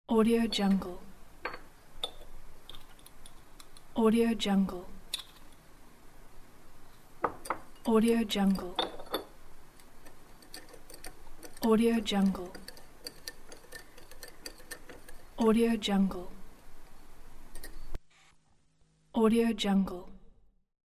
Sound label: glass bottle sound